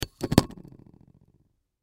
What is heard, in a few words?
Bowl Set Down, Bowl Set Down Glass Table Dish; Wobble; Household; Dishware; Blastwave